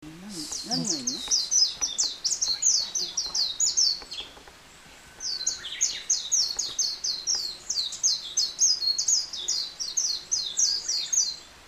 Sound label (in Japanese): mejiro